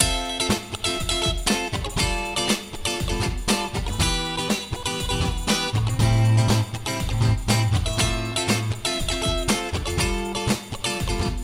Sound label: cutting acoustic